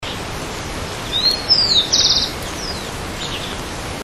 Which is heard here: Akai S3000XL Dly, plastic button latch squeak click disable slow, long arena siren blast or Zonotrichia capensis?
Zonotrichia capensis